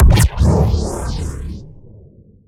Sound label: rise cast